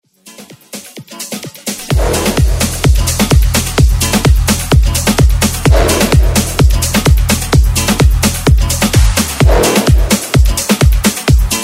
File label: Latin House Redrum